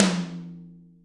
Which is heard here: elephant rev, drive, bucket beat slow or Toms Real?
Toms Real